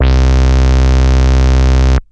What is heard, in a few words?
Techno leads, hits and riffs